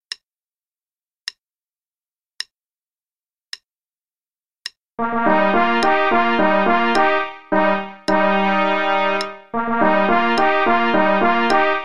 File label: Play-a-long, 1812 Overture, en=108